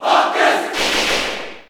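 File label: Cheer Japanese, crowd; Fox; SSB4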